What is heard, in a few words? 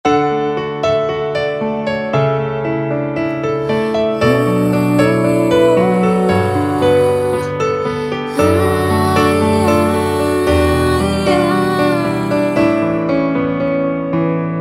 Piano